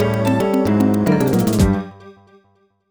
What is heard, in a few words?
M Lose; Zoo Frenzy; DSiWare; NDS